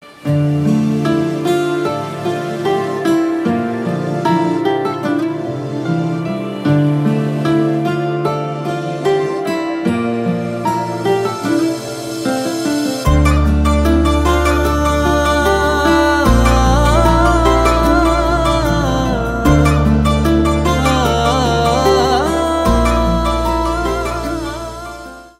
Instrumental Tone

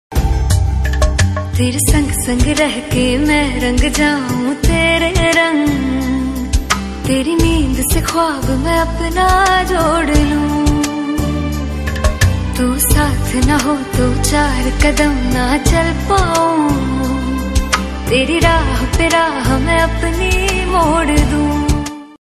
Female Version Ringtone; Simmba

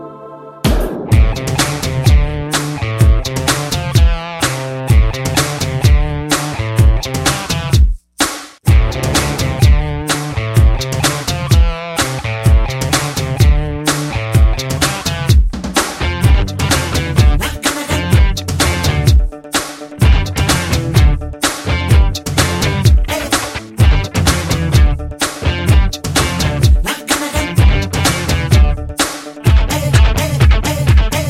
Drum Beats; Thuppakki; Telugu

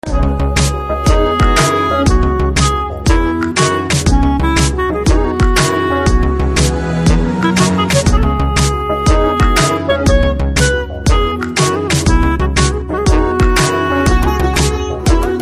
BGM Ringtone, Telugu; V

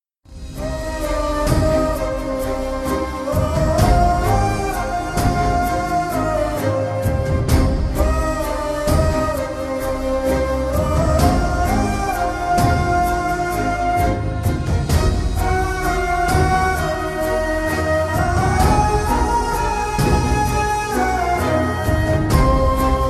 The Emotional BGM; Brothers Day; Malayalam

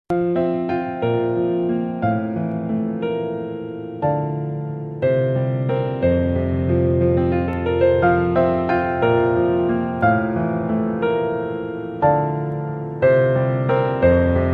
Instrumental Piano; Maestro; Telugu

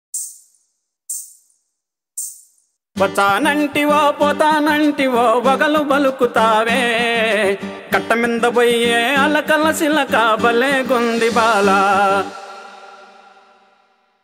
Promo BGM Ringtone, Telugu; Sreekaram

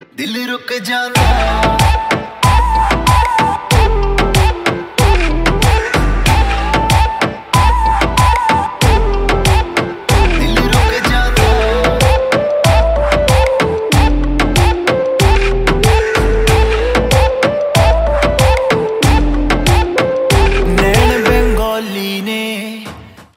Bengali Instrumental Ringtone

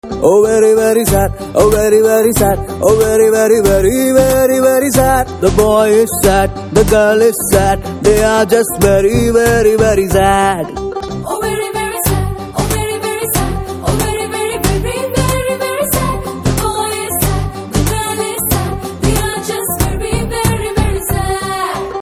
Very Very Sad Ringtone; Chal Mohan Ranga; Telugu

